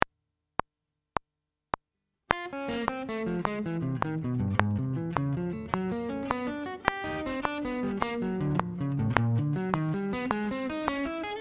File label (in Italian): Esercizio 2 fast 105 bpm